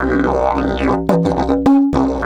108, DIDGE 108BPM

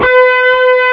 STUDIO F5, guitar lead

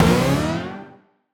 up G, Poly Chord Hits Ramp Up; future rave

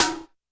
Snare Break, YAMAHA RM1X

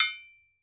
TOKE BEL, Drums&Percussion, Toke Bell; E-MU Producer Series Vol. 7 – Old World Instruments

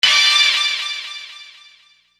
Stab; Sonic Foundry (Sony Creative Software); Infinite Soul VOL-1

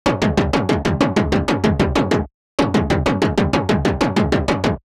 Sheet Kick 95, horrorscape samples 95bpm

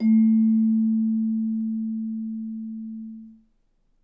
A#3, Percussion & Harp; Miroslav Vitous

